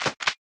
shotgun inventory move; factorio 2.0.55

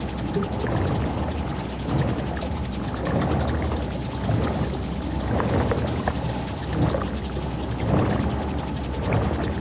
chemical plant; factorio 2.0.55

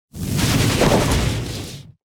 spidertron debris; factorio 2.0.55

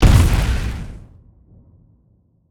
small explosion; factorio 2.0.55

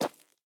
dirt, walking; factorio 2.0.55

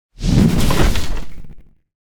tank debris; factorio 2.0.55

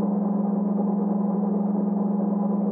express underground belt; factorio 2.0.55

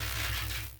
lab inventory move; factorio 2.0.55

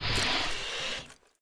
pump; factorio 2.0.55

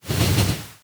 locomotive inventory move; factorio 2.0.55

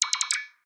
construction robot; factorio 2.0.55

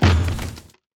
car wood impact; factorio 2.0.55

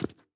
refined concrete, walking; factorio 2.0.55

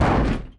car metal large impact; factorio 2.0.55

